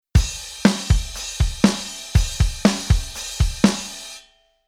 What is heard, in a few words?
Rhythm